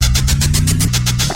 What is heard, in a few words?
High Speed Short